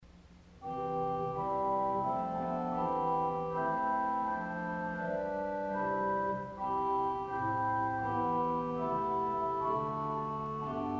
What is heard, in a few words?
Quintaton, organ stops; 16'; Möller 1951; arpeggio